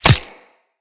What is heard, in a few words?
Player Bullet Impact Flesh; Weapons; Bullet Impacts